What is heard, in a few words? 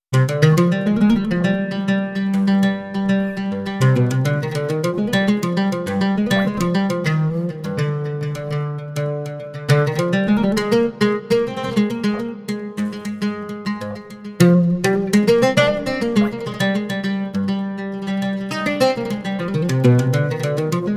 Arabic Oud